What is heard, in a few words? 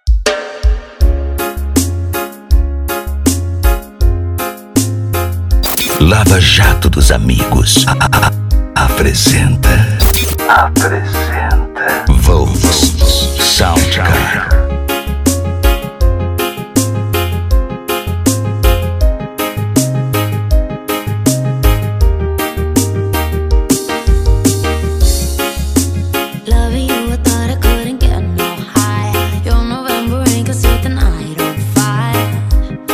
sound car reggaeton; cd volks sound car